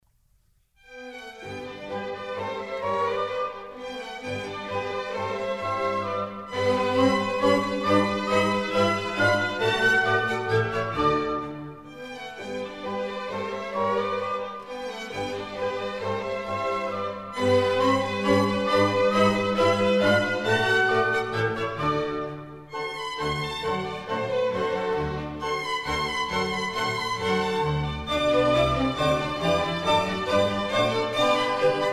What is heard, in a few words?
German Dances, G Major